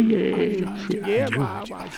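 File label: Music Village Calm Inside; from dust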